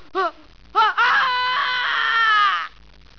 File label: scream; japanese